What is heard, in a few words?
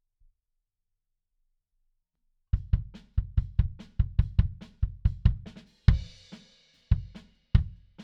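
Bass Drum In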